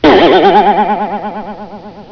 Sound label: BOING